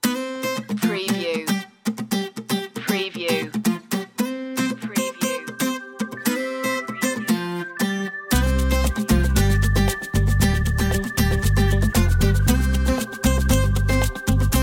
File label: caribbean night underscore